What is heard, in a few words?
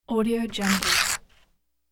paper double rip; hollywoodedge